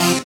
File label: SYN, dance; Sound & Vision - Gigapack II